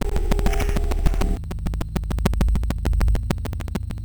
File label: buffer fuct loops